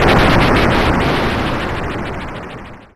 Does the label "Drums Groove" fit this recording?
No